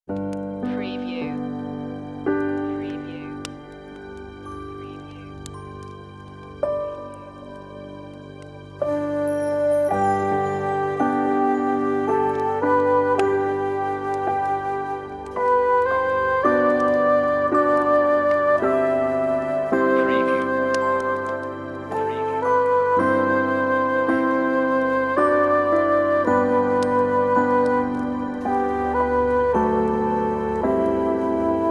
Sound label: Away in a Manger Relaxing Christmas, on-hold music; Media Music Now